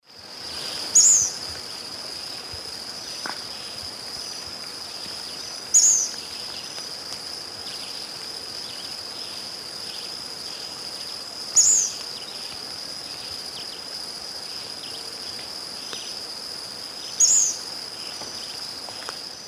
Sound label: Empidonomus varius, song; S. America; Eastern Lowlands